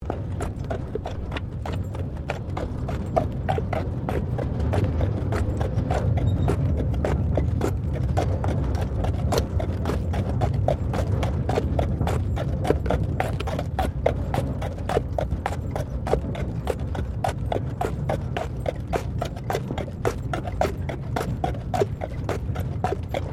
Tram Horse Drawn Sequence Gravel; Horse drawn tramcar; Carriages; Vehicles

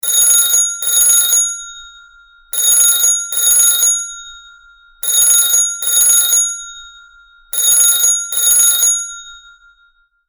household telephone old bell ring; Telephone (Old Bell Type) Ringing; Telephones; Electronics; McKinney Sound

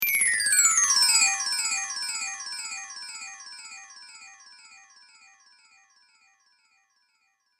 Chimes High To Low Delay, Windchime; Twistedtracks

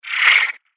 A Swish Force, Whoosh; Whoosh Sound Effects

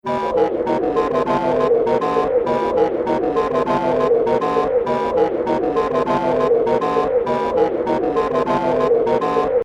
Haunted Carnival Machine Loop; Scary Soundscape; Fantasy, Horror And Sci-Fi Games; Andrew Fleming

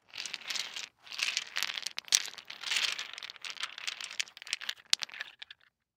Toy Wood Blocks Movement; Miscellaneous Toy (Sound Effects:Toys); Blastwave; Foley

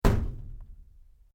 PLASTIC PIPE LARGE STRIKE; Material components for constructing more complex sounds

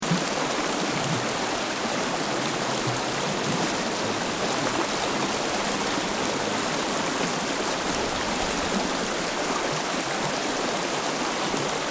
Water Stream Fast Rocky Loop; Elemental weather effects; Nature

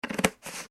household tub plastic lid on; Plastic Lid Placed / Screwed On Tub; Kitchen; McKinney Sound

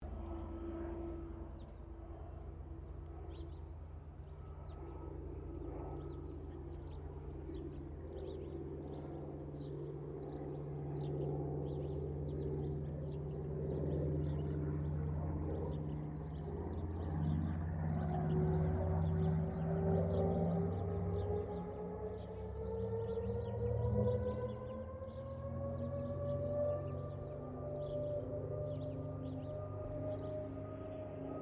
AIRCRAFT CA-13 BOOMERANG PASS STEREO; Airplanes (Sound Effects:Vehicles)

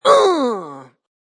Vocal Funk Grunt, Funk vocal loop sample.; 110bpm

